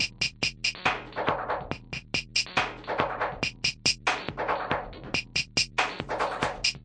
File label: 140 bpm freaky van banging tekno, drum loops and breaks